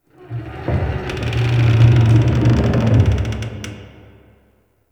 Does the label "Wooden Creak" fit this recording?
Yes